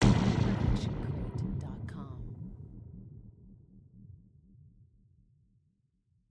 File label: designer sci-fi hit; SoundsCrate